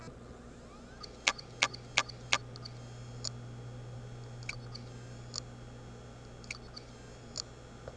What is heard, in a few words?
hd sounds, laptop, bad; mac disasters